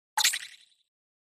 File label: Button Elect Whistle, buttons